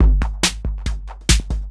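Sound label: DRUMLOOP ELECTRO 140; Techno2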